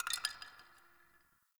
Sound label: Ice Crack One Off